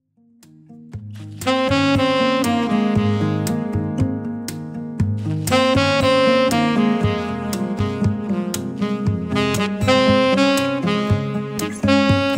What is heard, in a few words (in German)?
Saxophonist Empfang Lounge Hochzeit; Hannover